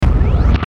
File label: Hit Crunchy Power Up